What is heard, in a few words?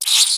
rat squeek